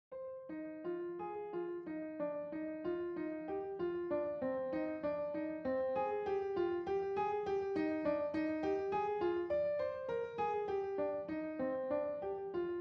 Piano

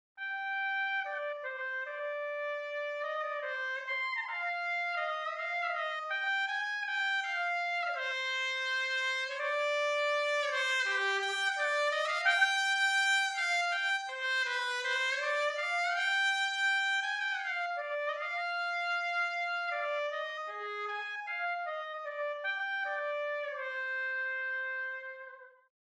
Trumpet

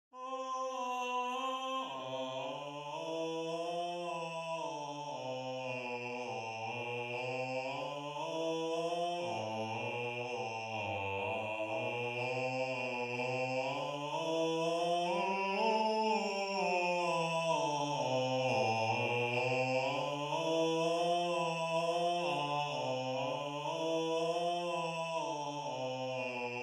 Bass, counterpoint

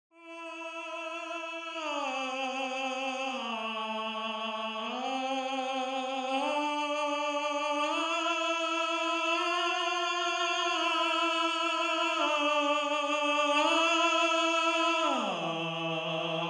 Tenor, counterpoint

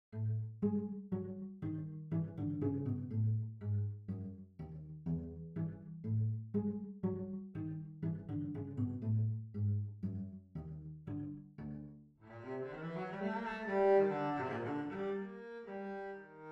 Cello; Rondo

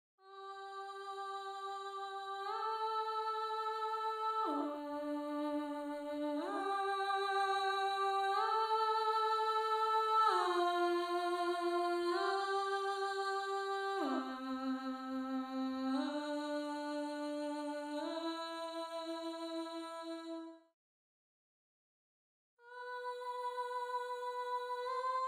Alto, counterpoint

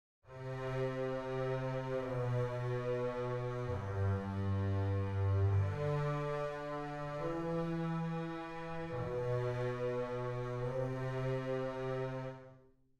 Double Basses

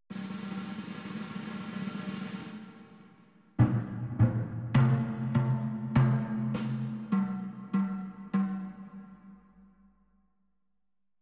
drumset

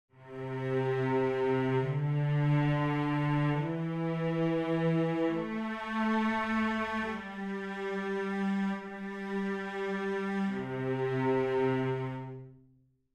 Cellos

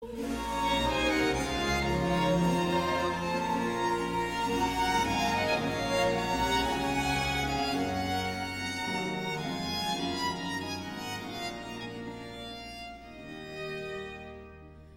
Ritornello; Monteverdi; L Orfeo; Early italian opera

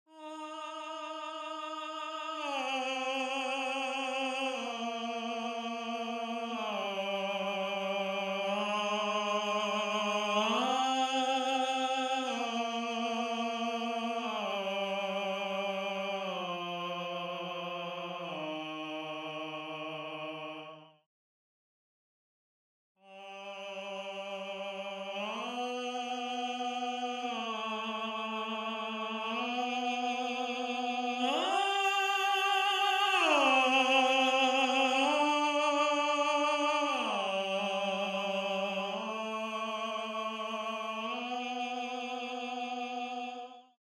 Tenor

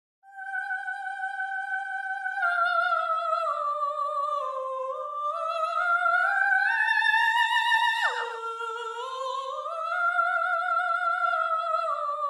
Soprano

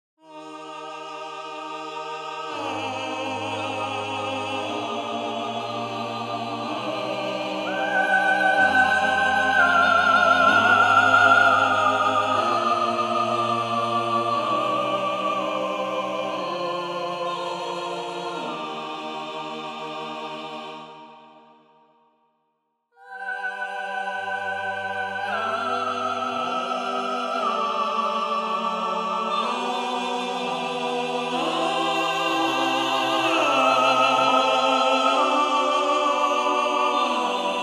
counterpoint; Artinfuser Counterpoint

